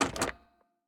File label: Switch Off; MiSide